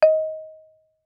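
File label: Marimba rubber fortissimo Eb5; MIS Pitches - 2014; Percussion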